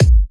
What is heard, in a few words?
Hard Kicks; Vengeance Samples